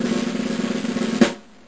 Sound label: Drum Roll Sound